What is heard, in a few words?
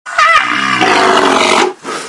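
sounds of rhinoceros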